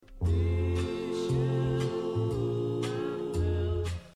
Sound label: Choir